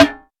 Low Tone Steel Snare Drum D Key

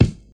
focused bass drum single shot g sharp key

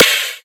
rich top end trap snare sample c sharp key; snare drum samples

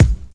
nineties rap kick one shot b key

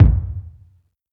long room reverb bass drum sample a sharp key

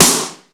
short reverb steel snare drum f sharp key

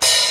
dark tone open hi hat one shot c key

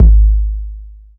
long room reverb kick sound g sharp key

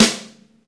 sparkle acoustic snare sample a sharp key; snare drum samples

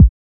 smooth kick sound d key

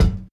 present bass drum sound F sharp key; kick drum; kick drum samples

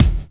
smooth kick sample c sharp key

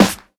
natural snare sample g key

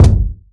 bass drum sound f sharp key; kick drum; kick drum samples

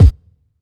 boomy kickdrum sound f sharp key

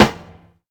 round snare one shot c sharp key

